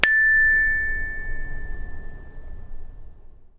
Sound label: chinese bronze bell front; sackler